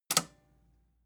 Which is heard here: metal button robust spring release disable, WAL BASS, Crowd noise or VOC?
metal button robust spring release disable